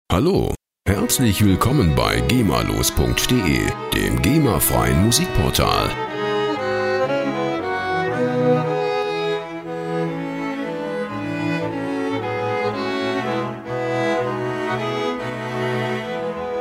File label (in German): streichquartett